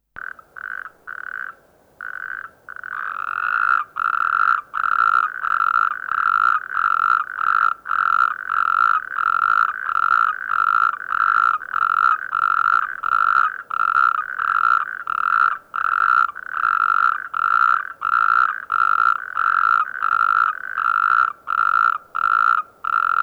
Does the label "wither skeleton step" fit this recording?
No